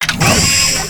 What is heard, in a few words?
Steam Walker Atk; FlyForInsanity